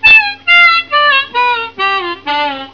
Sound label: quick bend own